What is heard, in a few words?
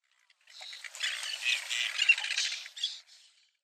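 Greater Crested Tern; okinawa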